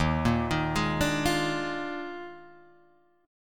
D sharp Major 7th Suspended 2nd Suspended 4th, down, Guitar, Open G